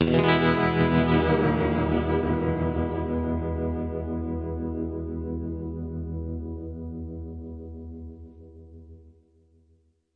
guitar tremolo chord

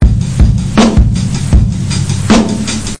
queens beat, drum loops and breaks, 080 bpm; 000 to 080 bpm

